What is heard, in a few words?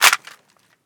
pp19 mag tap; bizon; garrysmod